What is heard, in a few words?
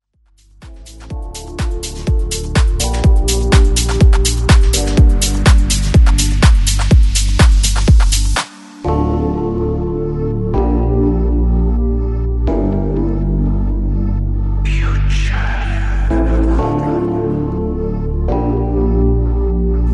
Mash Up